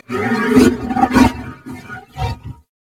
Horse Trot Breath; Vehicles; Vocals; Warframe; Duviri

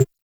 UI Common Countdown

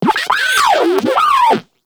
Moa Compassionate Vocal Death; Warframe

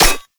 Vial Ability Orb Spawn; Warframe; Warframes; Alchemist

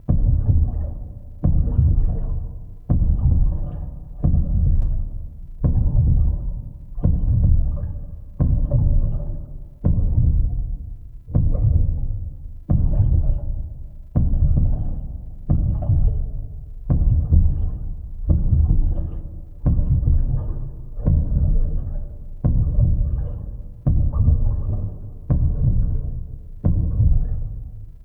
Dormant Vessel Alternate Heartbeat Loop; Ambience; Props; Warframe; Entrati

